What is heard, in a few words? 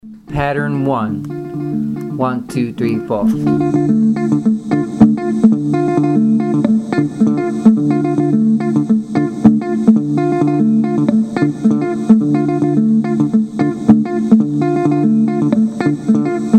mozambique patterns